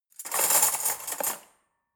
cutlery forks drawer place soft

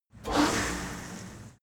cleaning machine start running stop rev up

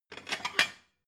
plate cupboard take slide

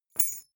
key ring small drop carpet soft

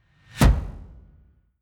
incoming flutter echo

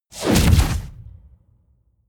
incoming explosion

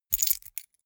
key ring small grab hard

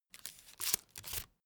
cigarette rolling paper pull out

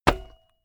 bmx bike pedal grip single

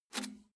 bmx bike release bar soft

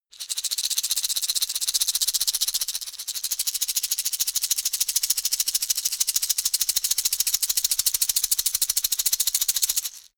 shaker wood rattle fast hard

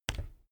bicycle brake handle back pull

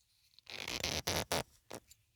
glass bottle close aluminium lid tight long

